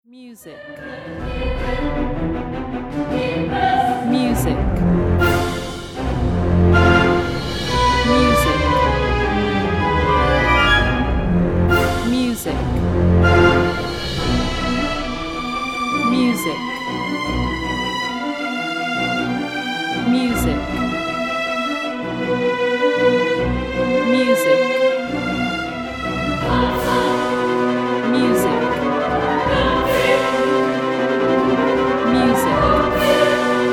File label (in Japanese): ダークファンタジーボイスドラマ 戦闘BGM